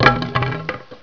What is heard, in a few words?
fall; openffa